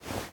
Cloth Crouch Up

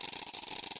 Propeller hat Loop; Propellerhat Caveman

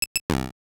BGM; Metamode 2000 GBC

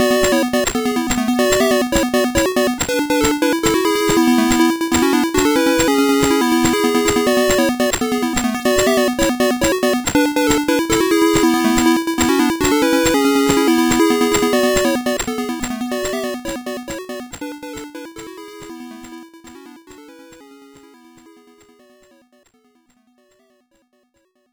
Big Enemy Battle; Wibarm; Tandy 1000

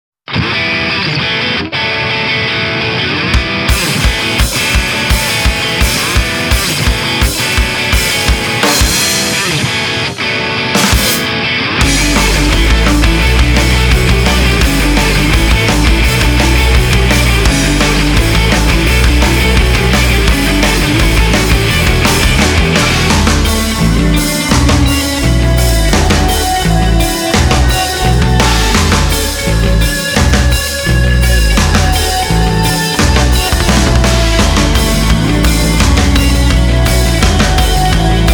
(Instrumental); Collar Malice edition

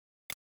Tocker

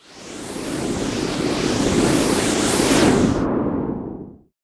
SFX Powershot Ready; pangya fantasy golf

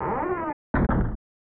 Slammed Shut; Clock Tower The First Fear

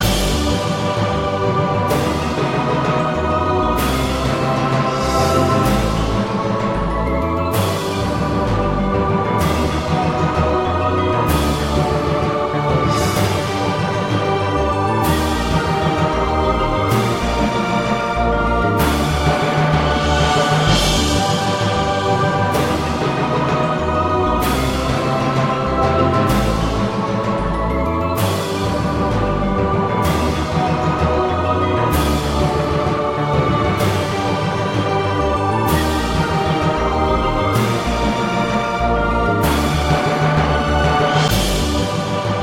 Climb to Woodburrow (Gate Opens)

